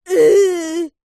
human child boy 8 english grunt mocking angry uhhh